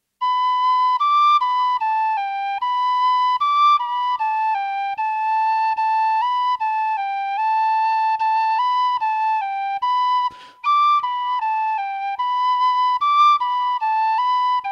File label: Eagles Whistle